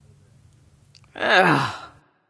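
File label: ugh, female; smite; voicepack; hera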